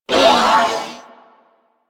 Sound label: Purgatory Warrior Death, Barks; Warframe